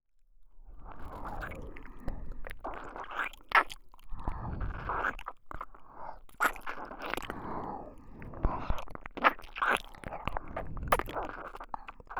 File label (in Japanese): 両耳舐めオンリー; 甘く蕩ける囁きとにゅるにゅる耳舐めで断れない生徒会長の誘惑